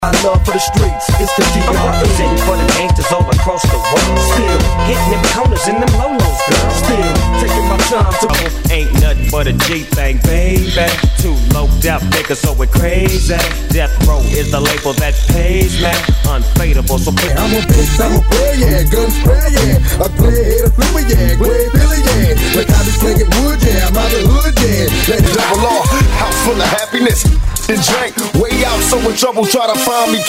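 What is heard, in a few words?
Old School Hip Hop Megamix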